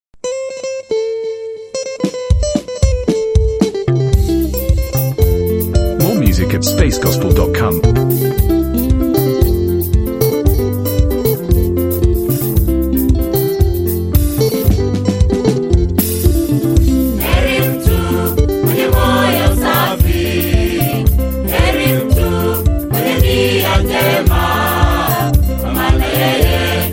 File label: protestant trainees choir; Kenya Prisons Staff Training College